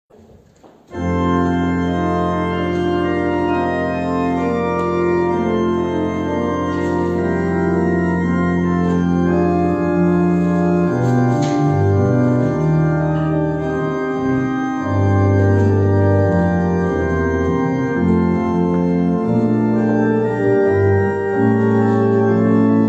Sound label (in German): Praeludium in F dur; J. C. F. Fischer; wien favoriten